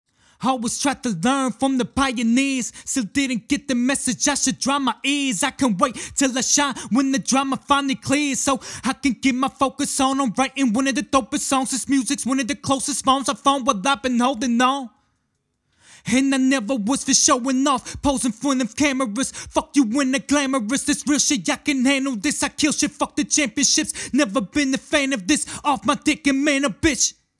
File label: fethead germanium